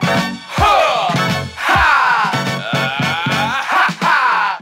horns dundun huh dundun ha dundun dah dah dun ha ha, loops; Phonky